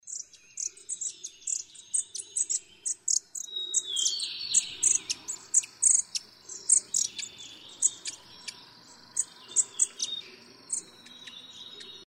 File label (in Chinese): 紅頭山雀; 紅頭長尾山雀; 長尾山雀科/留鳥